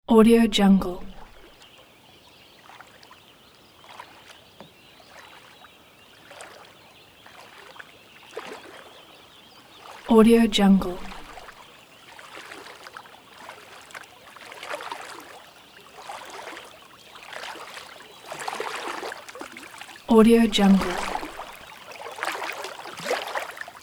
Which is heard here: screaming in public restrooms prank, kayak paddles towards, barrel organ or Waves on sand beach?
kayak paddles towards